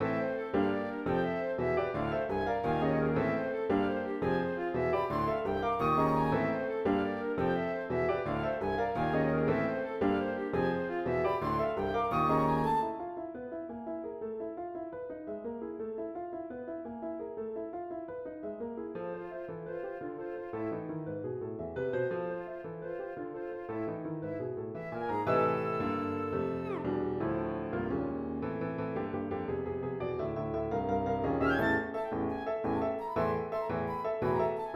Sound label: Triplo Espresso MAN Trio